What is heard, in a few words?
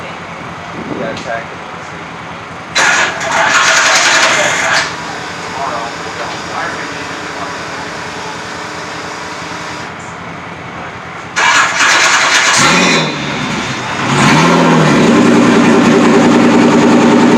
Starting Truck, Nat Sound